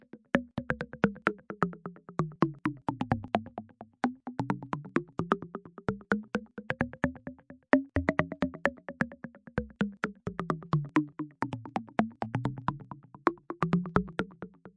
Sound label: bubble on the right synth, 130; SYNTH AND ELECTRONIC LOOPS